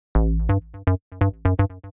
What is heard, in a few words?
SNTH BASS PROGR 125 A; eJay